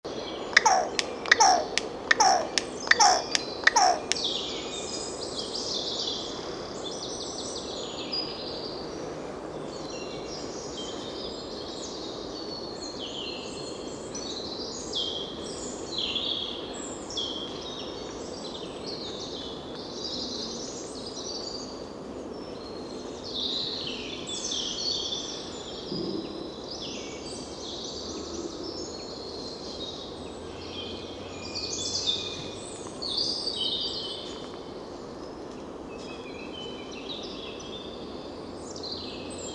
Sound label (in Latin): Corvus corone; SETE